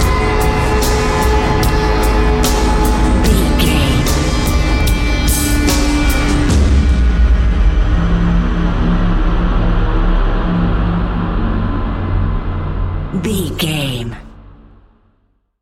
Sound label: harvest nightmares